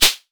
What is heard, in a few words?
Shaker Pairs; Shakers